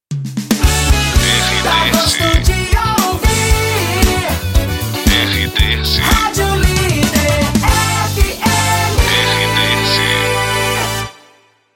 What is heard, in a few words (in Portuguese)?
PASSAGEM RADIO; Rádio Líder